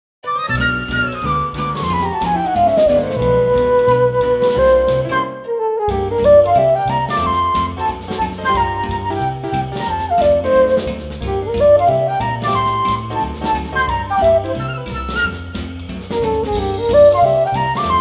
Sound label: Flute